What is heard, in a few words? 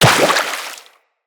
Operator Run Water, Footsteps; Characters